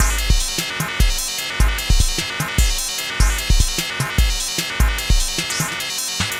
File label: Electro